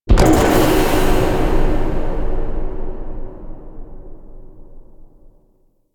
Sacrifice Chamber Door End; Warframe

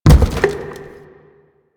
Gas Tank Impact; Warframe; Nineteeen Ninety Nine; PvPvE

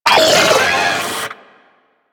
Arachnoid Vocal Leap; Warframe; Corpus; Arachnoid Hunger

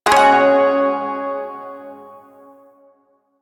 Shawzin Yo Chord G, Music; Chords

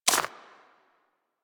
Bird Of Prey Step Dirt; Warframe; Footsteps

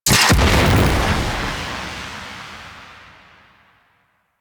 Radiation Barrel Explosion; Warframe; Ambience; Corpus Gas City; Gameplay Remaster

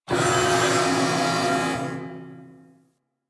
Gas Robot Repair Spin; Ambience; Props; Warframe; Corpus Gas City

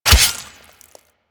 Melee Sword Impact Flesh; Warframe; Weapons; Swords

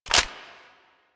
AK74 Clip In; Warframe; Kalashnikov; Reload

